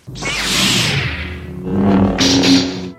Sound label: light saber; Zaps and Beeps